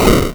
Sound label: attack